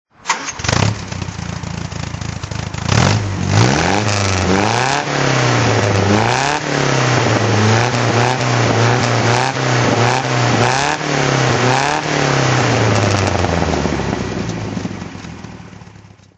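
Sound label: mg mga 1600 mk2 deluxe throttle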